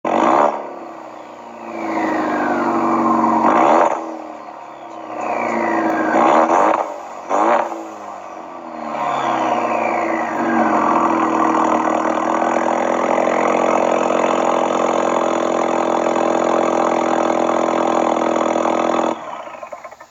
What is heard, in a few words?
Mustang EcoBoost Rev